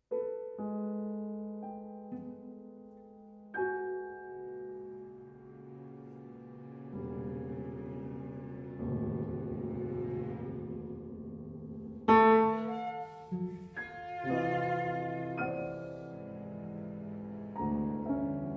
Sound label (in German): klaviertrio